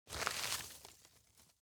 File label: foley leaves grab crunch medium; smartsoundfx